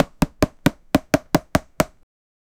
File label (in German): hartes objekt prallt auf